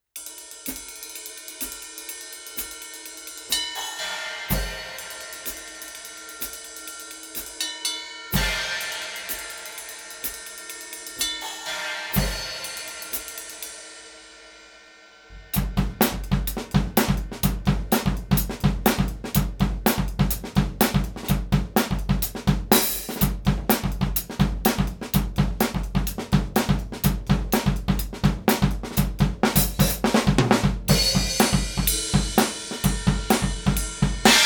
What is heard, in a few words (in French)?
Enregistrer la batterie Technique 3 Micro derrière le batteur